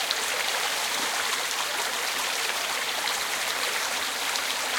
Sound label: Liquid Fast; Puddle